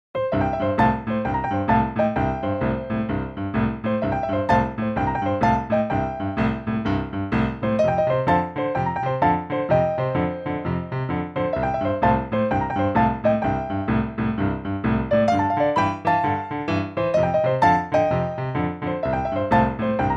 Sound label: Blues Exercise; Technical Exercises for Improving Keyboard Technique